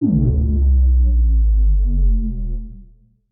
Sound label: buun